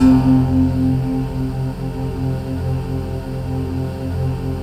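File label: chord pad, Pads; Best Service; XX Large - Pads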